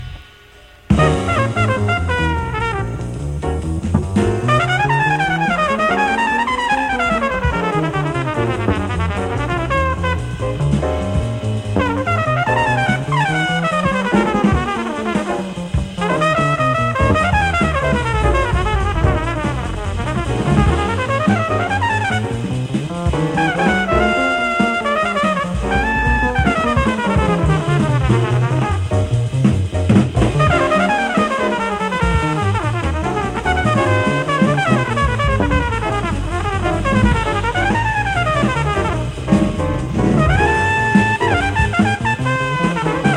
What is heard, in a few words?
solo only, jazz trumpet